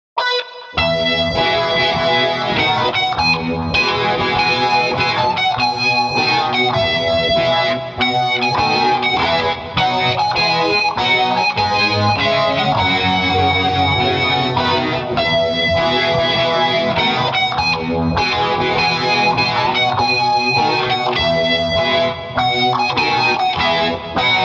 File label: Full Orchestra; Verdi; Grand March